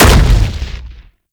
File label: Rupture Pillar Burst; Roathe; Warframe; Tau; Abilities